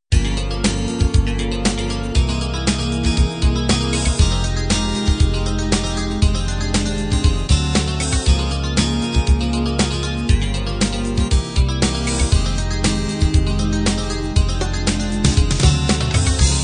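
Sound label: standard 16 beat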